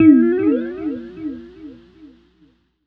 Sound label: Fretting Off C, Guitars; Total Drum&Bass